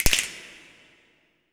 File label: snap afro, afro snaps, hands; Roland LCDP14 Africa VOL-2